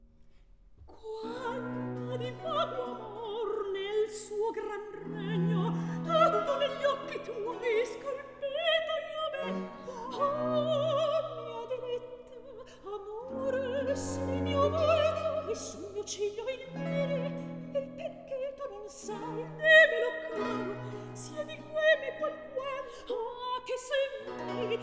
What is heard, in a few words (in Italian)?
Recitativo; Atto III Scena 5; Tullia, Cleonilla; Antonio Vivaldi (1678 - 1741); Vivaldi - Ottone in villa (2010)